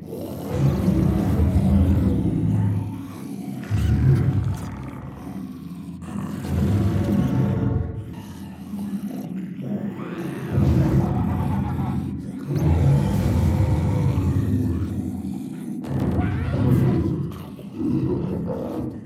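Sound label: ambience monsters nextdoor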